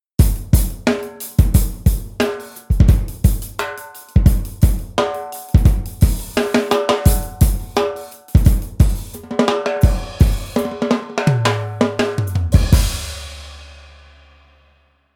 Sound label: metal stx